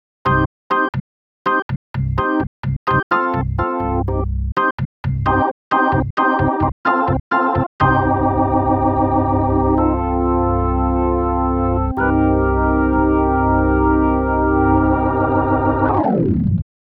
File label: vintage b3